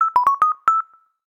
Firefly, notifications; Rollable LM R910N; LG

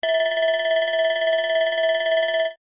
Electronic Alarm Bell; Coolpad; ESurfing-No1-2021

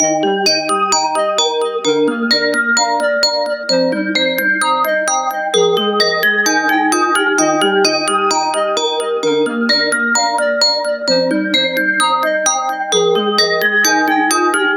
Toy Train, ringtones; SKY IM-A890S-VEGA-Secret-Note

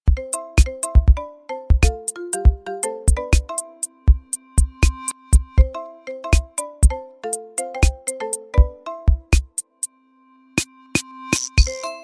ringtones; Tecno Spark Go 2023; Flea

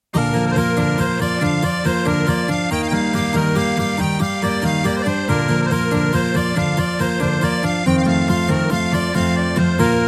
happy flying, ringtones; ZTE Nubia Z5

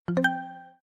Bubble, notifications; ZTE Axon 20 5G

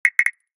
Knock, notification; Nokia; X

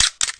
camera click short; Vivo X1; ui

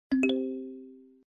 sent message success; Coolpad; ESurfing-No1-2021

